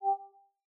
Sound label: notif